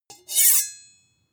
sword blade grind ring

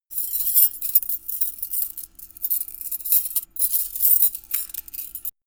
cutlery handling

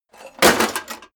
metal plate armor hit

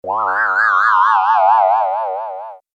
sweep synth weird

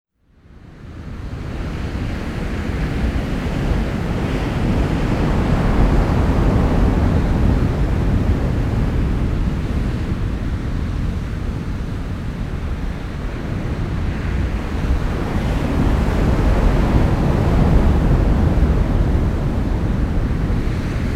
cliff

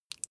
flesh wet fast squish single

